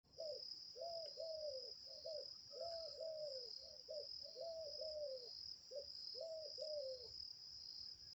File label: mourning dove sound